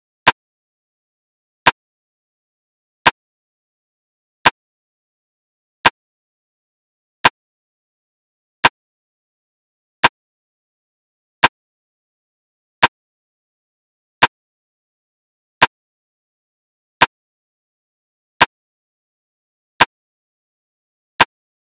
drum loop 86 BPM rim; Loop Stems; Cymatics; DOPE DRUMS VOL. 1; Bunny Drum Loop

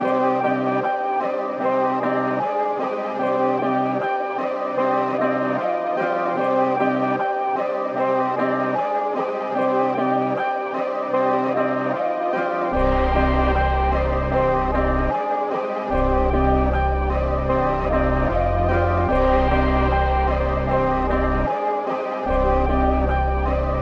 151 BPM C Min, Drill; Good & Evil

